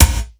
MONDO PERC, METALIC DRM

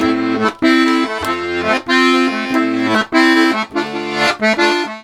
Accordions; USB Soundscan vol.40; AKAI

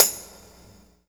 Tambourine OS; Sonic Foundry; Rondo Brothers Trip-Hop Loops & Samples; Apologists

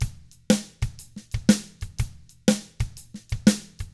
122 SHUFLT, Double Platinum Drums, 122 SHUFFLED; ILIO

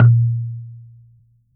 coconut; Unique Instr

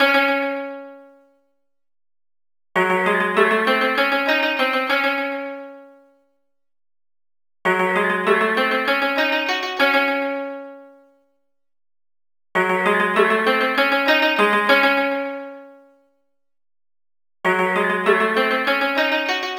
Taisho Koto; Sonic Foundry (Sony Creative Software); Club Joints and Street Anthems

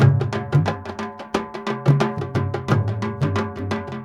INDIA R, Heart of Asia, IND ENS

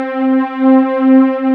STR G PD C4, KORG 06; USB Soundscan vol.49; AKAI

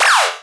laser windscreen

